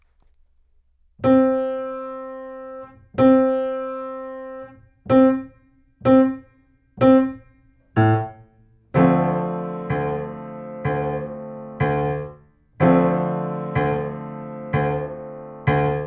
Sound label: C 8 bars, sax